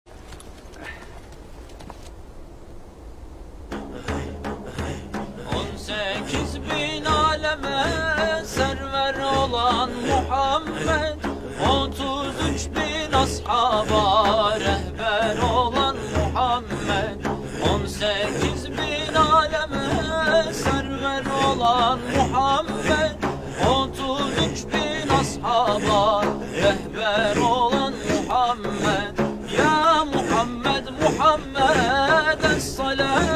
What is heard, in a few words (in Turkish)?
naat; yunus emre